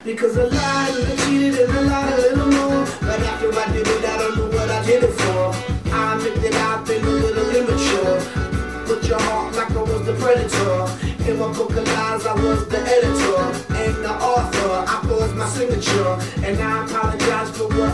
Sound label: song